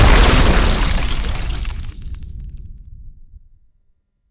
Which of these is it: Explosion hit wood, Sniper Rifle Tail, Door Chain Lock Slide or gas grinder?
Explosion hit wood